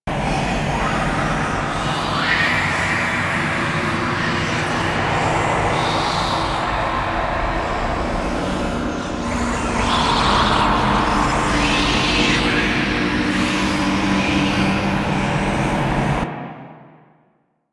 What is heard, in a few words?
Whispers Loop; Ambience; Orokin Moon; Warframe